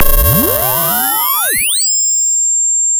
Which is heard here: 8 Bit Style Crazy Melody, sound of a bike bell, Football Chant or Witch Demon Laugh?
sound of a bike bell